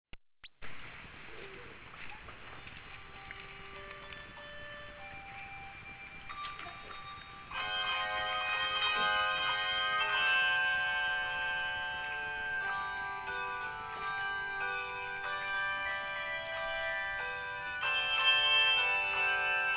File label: Bells